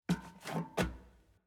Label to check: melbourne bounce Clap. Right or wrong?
Wrong